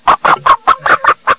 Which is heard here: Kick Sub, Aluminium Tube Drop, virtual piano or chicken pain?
chicken pain